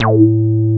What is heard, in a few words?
TB BASS